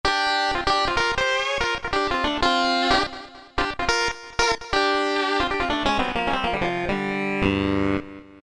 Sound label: 5th Lead Synth; Roland PN-D50-01